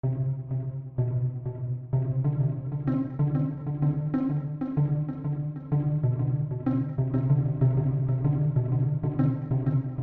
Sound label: Reach Deep Strings